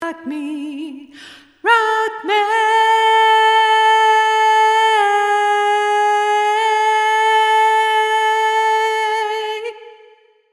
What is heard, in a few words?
ending solo